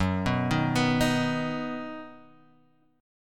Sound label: F sharp Suspended 6th; arpeggiated; F#sus6